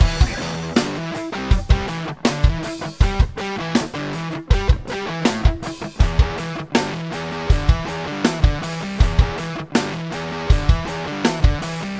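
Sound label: Drums Guitars Dry; universal audio uadx sound city studios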